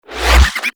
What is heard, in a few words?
Cast Start; Warframe; Bard